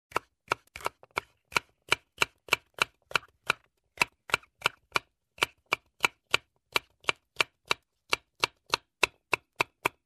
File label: Cutting vegetable